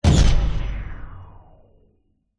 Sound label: science fiction hit impact